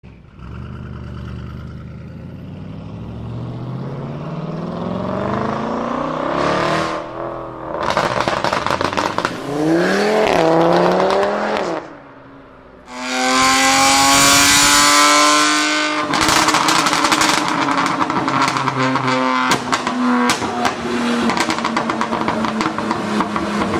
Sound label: backfire